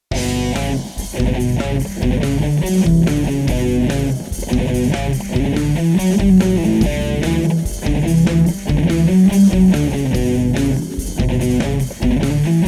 harder funk

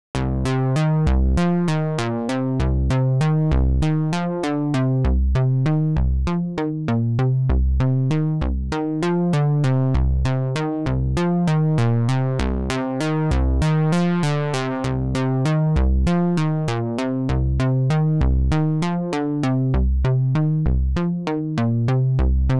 Eventide H9000; Repro1 Original

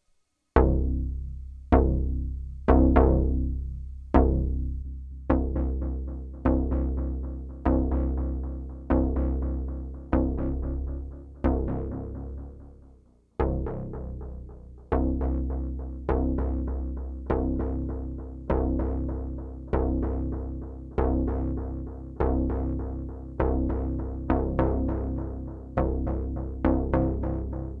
Vintage Delay

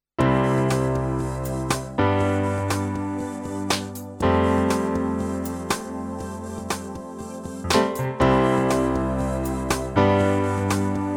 Kross Combi Heaven Pad

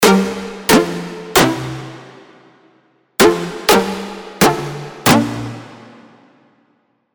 EDM; Nexus 3; Fever

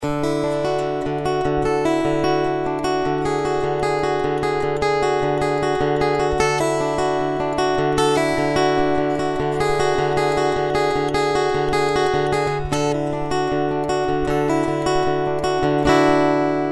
psycho picking

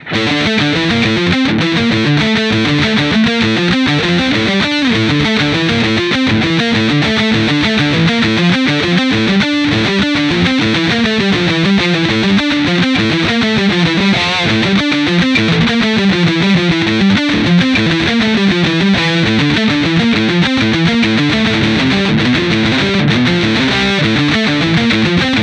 Metally

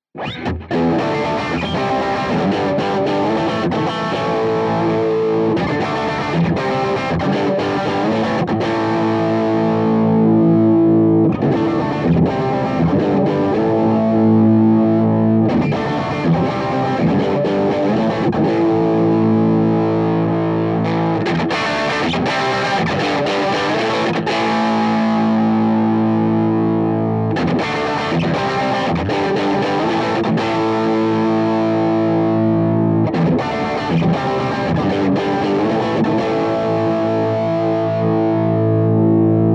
Tele Gain Mic Position

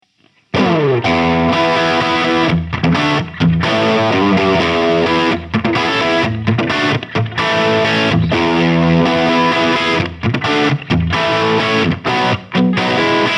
Humbucker Brilliant; Sound City Master One Hundred

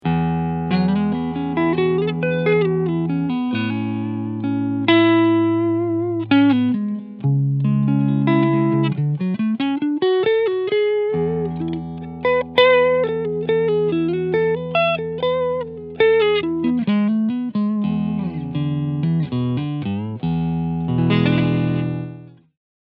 TE 69TL Hot Rod NT Roasted Clean Sound Front PU; Harley Benton

